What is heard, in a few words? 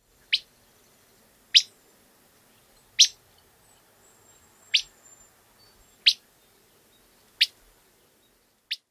wit calls